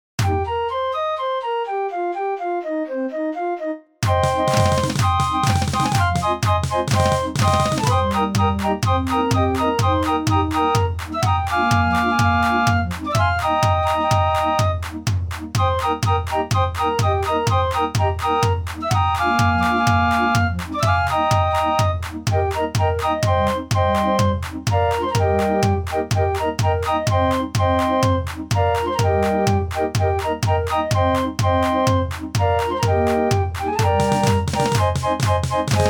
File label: boogie, draaiorgels